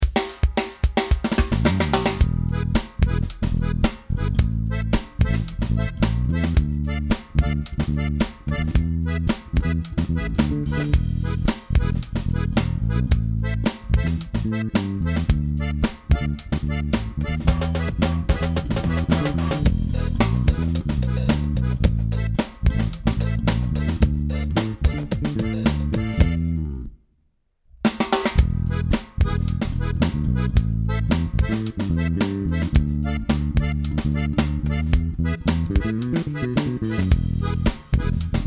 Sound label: Mad Sally wo vocals; Mac Wellman's Dracula